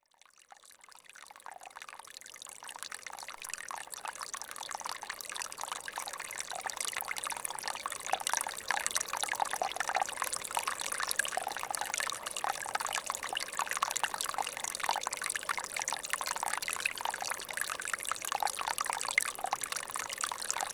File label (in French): Ruisseau 1700m